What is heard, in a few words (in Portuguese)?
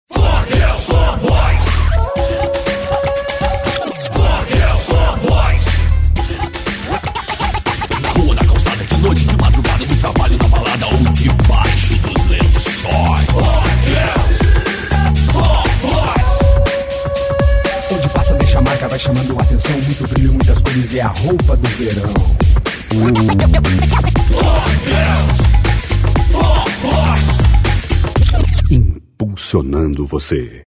jingles loja de roupas moda jovem; Jingles para Publicidade e Propaganda